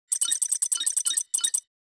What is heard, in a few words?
Digital loading